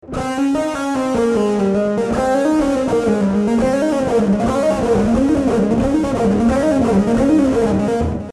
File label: legato